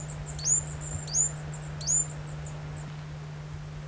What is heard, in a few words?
Madeira Firecrest alarm